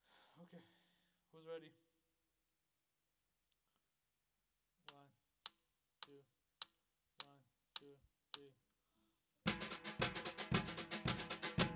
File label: SNR